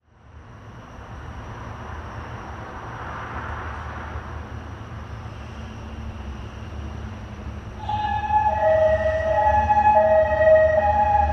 City Skyline Busy Night; big city

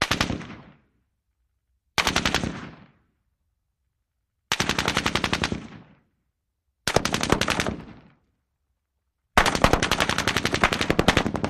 M60 Mediumlong Burst

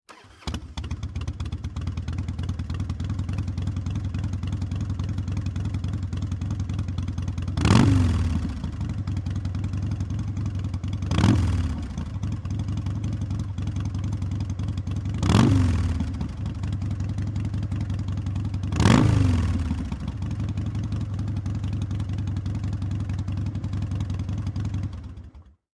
harley start idle

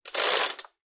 Chain saw chord being pulled and released.; Tools, Hand Wood, Sawing Saw, Chain

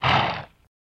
Horse Blow Generic

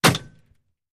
Bullet Impacts Metal

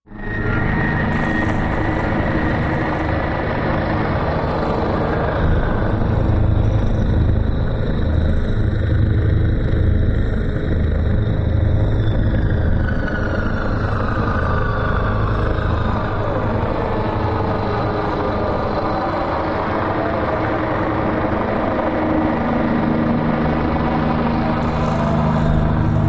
Dawn Low Amb Elect, eerie ambience

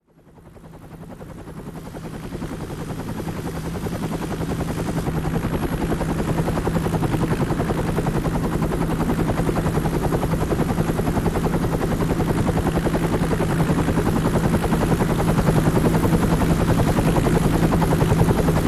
UH-1 Huey: In Flight. Interior / Exterior Use

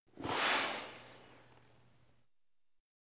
Air Release Space

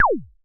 laser; lasers

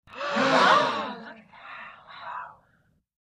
Gasp Faint Wow Sml Cro

